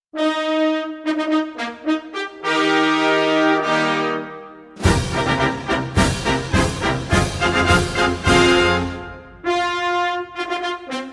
anthem

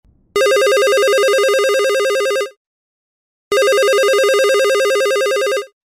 dynatac 8000x, zvuki telephona motorola

